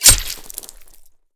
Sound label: hit, knife